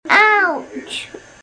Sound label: Ouch